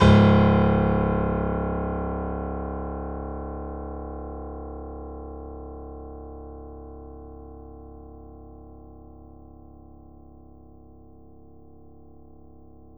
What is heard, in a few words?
Grand Piano C#0 ff